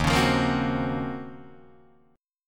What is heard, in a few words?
Eb Minor 13th Eb down Guitar DADGAD; Ebm13 strummed; JGuitar